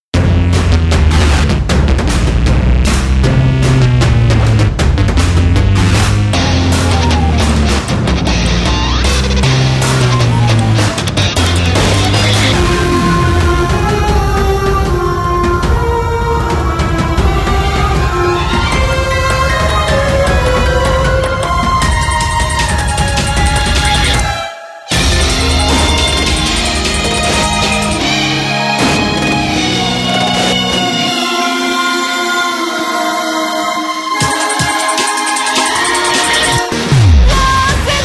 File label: Battle BGM